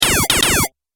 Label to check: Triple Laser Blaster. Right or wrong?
Right